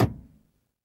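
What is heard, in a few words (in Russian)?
глухой короткий стук закрытой двери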